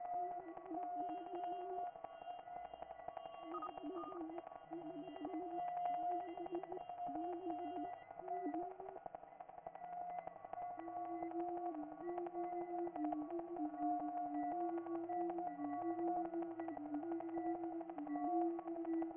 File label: qrss audio